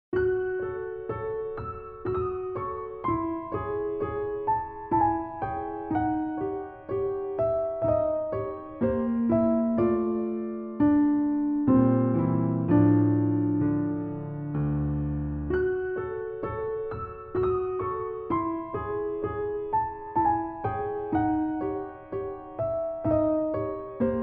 PIANO SATINÉ

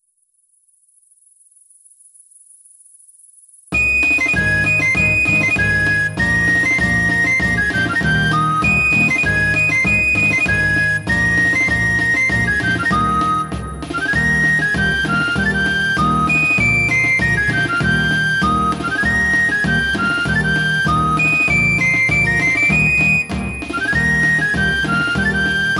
farandole de l'été

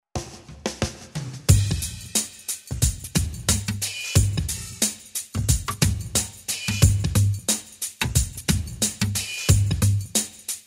GUITARE VS DOBRO

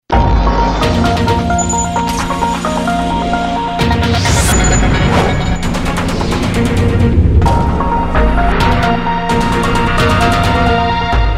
TRANSITION DEFI

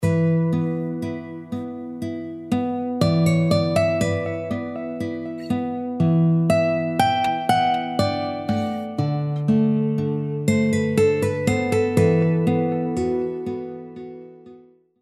GUITARES

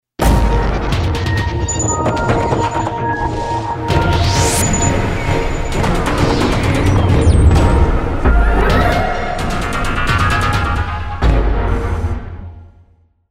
TRANSITION

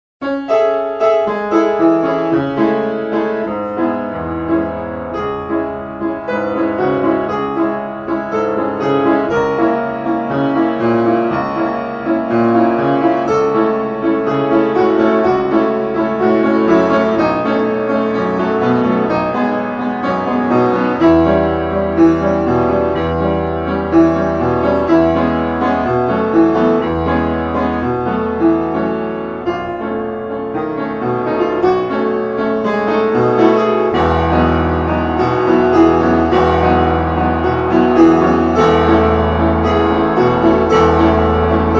PRELUDE 10 EN DO DIESE MINEUR

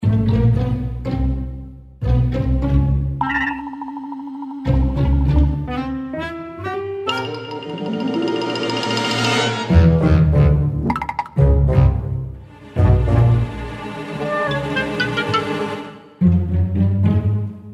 AMB ATTENTE

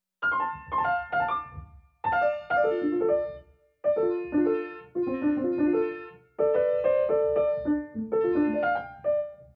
FIORITURES PIANO

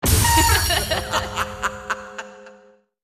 RIRES

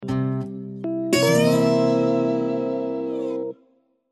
VIRGULE DOBRO

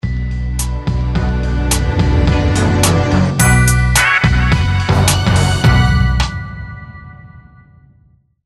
virgule out investigation

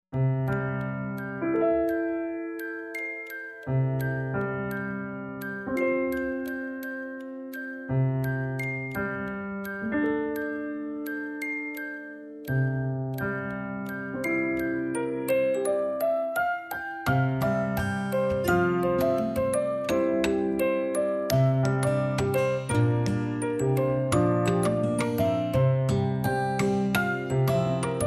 ENFANTINE PIANO

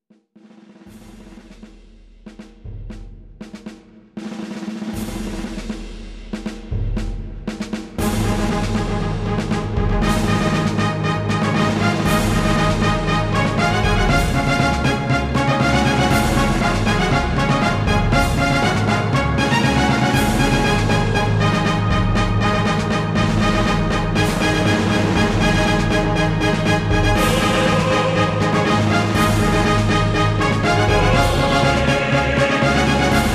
CHARGE ROYALE

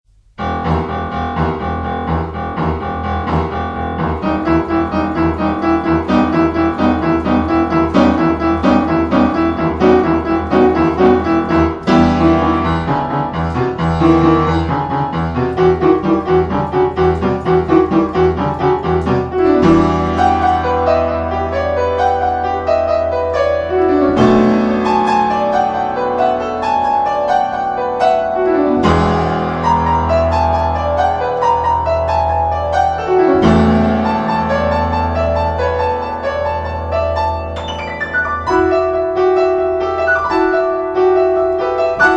PRELUDE 4 EN MI MINEUR

